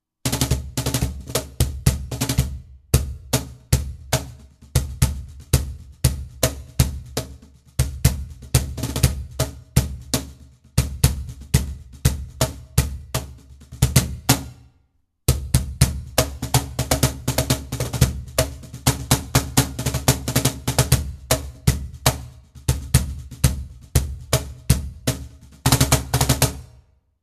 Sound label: Cajon Buleria; Jose Cortijo